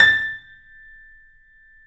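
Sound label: piano, A, left; InVision Interactive - The Piano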